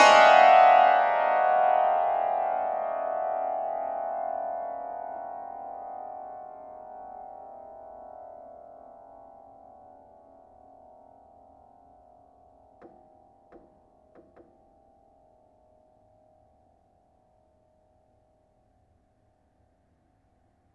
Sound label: String Brush No Att, Piano; Piano Miscellaneous